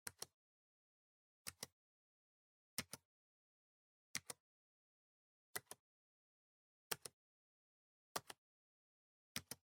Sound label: PC Keyboard Single Gentle